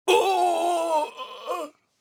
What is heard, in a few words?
Voices male death grunt; Out of Reach: Treasure Royale